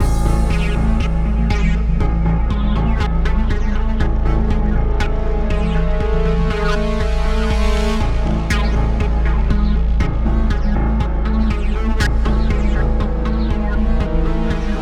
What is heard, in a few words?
Ambient Fight, Music; NWNDS; Ambient music pack; Fight (RT 4)